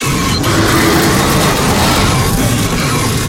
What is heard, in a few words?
scrape, tanks